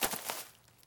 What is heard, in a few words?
brush step; Golden Crayon Sounds